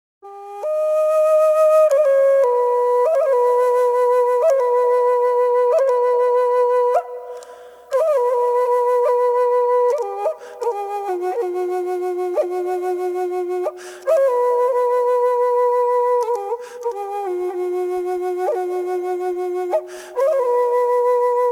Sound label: flute song